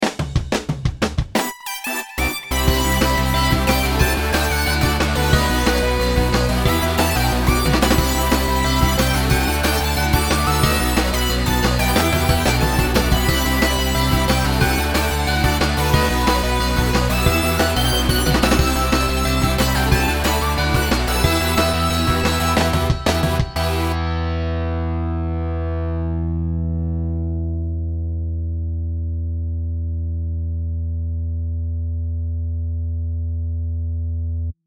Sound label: Karaoke